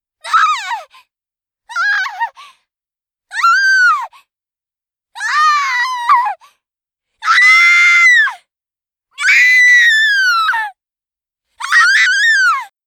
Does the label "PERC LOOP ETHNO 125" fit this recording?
No